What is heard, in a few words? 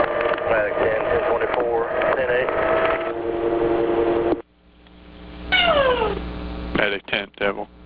beeeoooo; Something in the background explode???